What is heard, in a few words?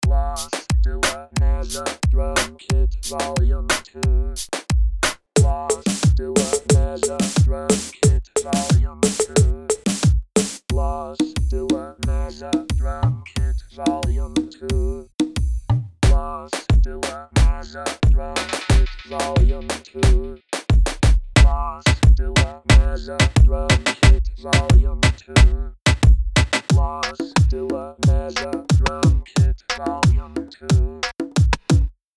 Drum Kits